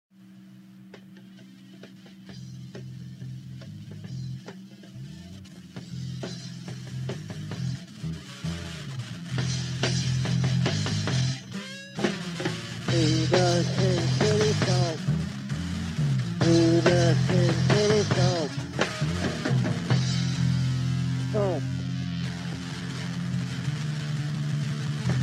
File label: Stomp